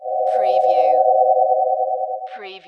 Amb oldtime

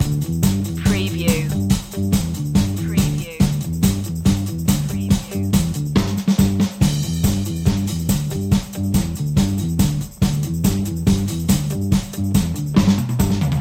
rhythm kings loop

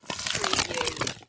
Scifi Production Element - Static Escape

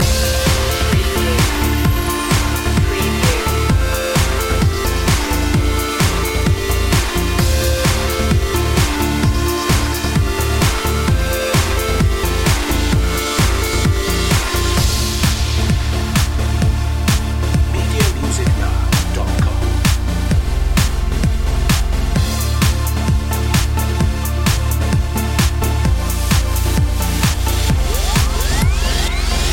laserbeam loop

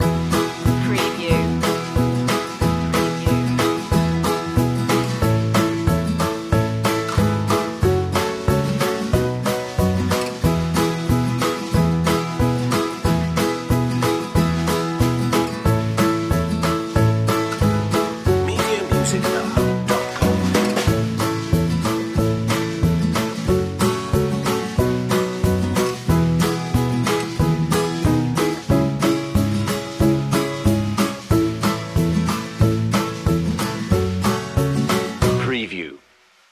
loop

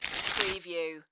Rip Paper

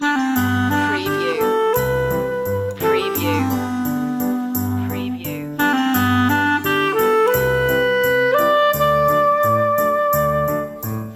christmas loop

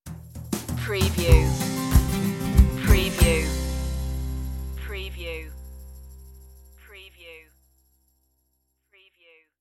hickabilly stinger

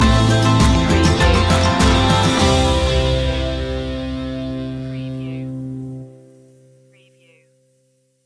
see you smile stinger

